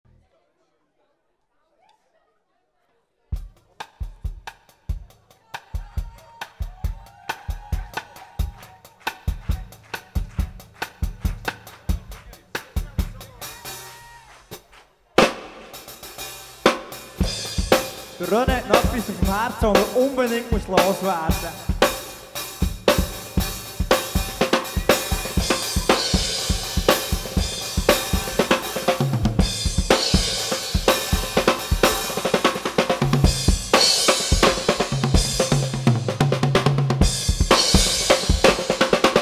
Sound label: Drums Encore; NDog Arena